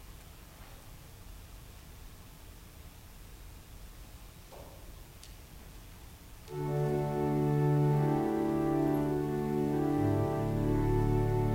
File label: Organ Music